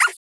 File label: Cat Range HIT